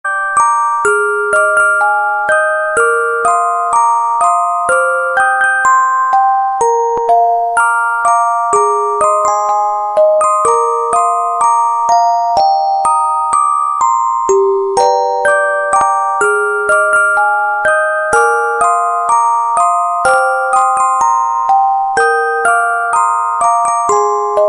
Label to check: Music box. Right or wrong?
Right